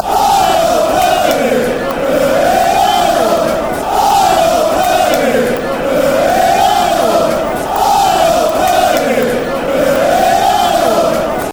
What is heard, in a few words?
aye oh bury, chants